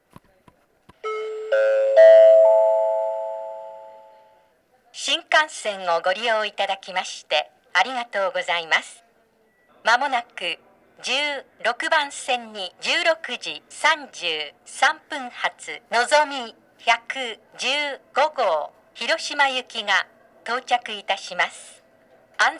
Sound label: nagoya s nozomi hiroshima; station; jr-central